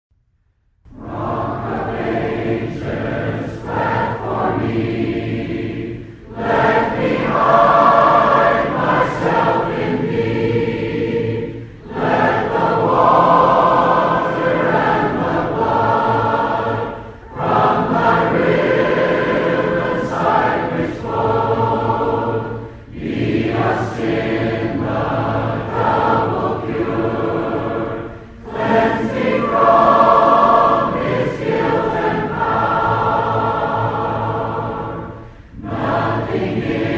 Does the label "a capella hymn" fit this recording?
Yes